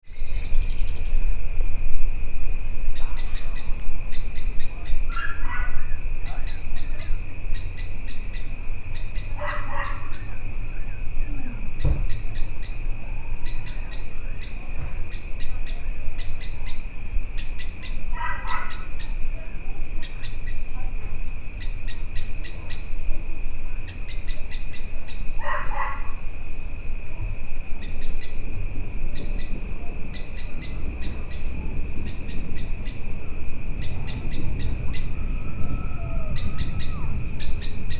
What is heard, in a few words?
blackbird 2nd call